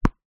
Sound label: sport tennis ball bounce on court; zapsplat